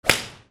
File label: GAMES